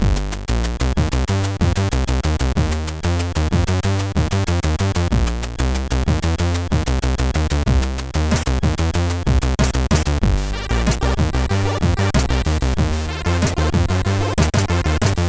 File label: Looping Crackmuzak, CSG8580R5